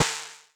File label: Tempest Analog Snare